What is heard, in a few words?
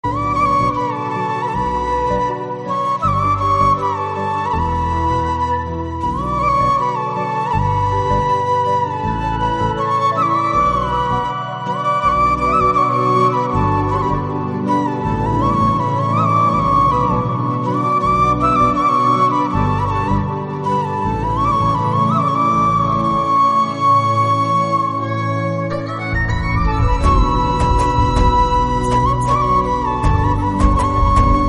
bgm flute; ringtone; kgf 2